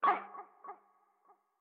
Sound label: Hurt; StyxMech